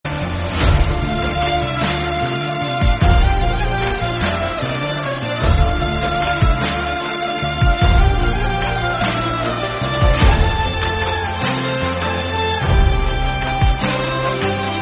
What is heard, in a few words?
Instrumental Female Version